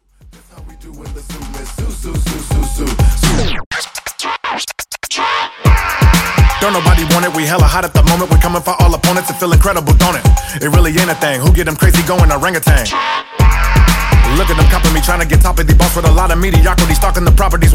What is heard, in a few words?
124 Bpm; Dj Intro Outro